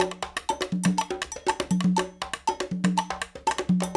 120 Songa, African Contemporary; Spectrasonics; Supreme Beats; Songa Ltn

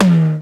LINN tom; Hollywood Edge; The Big Bad Beatmachine

